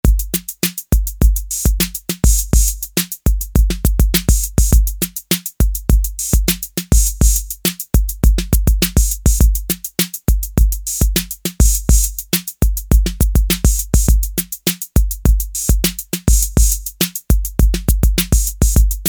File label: ROCK, Roland TR-808; Factory